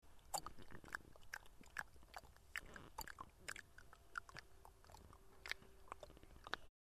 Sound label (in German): schnalzchor